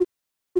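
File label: corner beep; Splashdown; XBOX; Soultree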